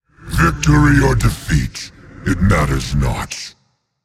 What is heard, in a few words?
yay; smite; voicepack; set